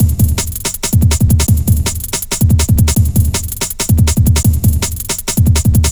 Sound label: 162, breaks, Loops; M8